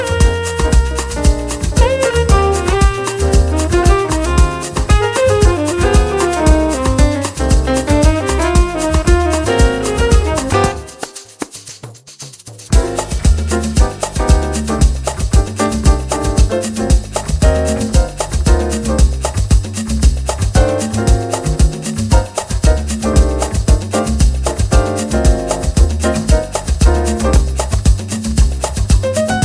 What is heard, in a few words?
Samba Instrumental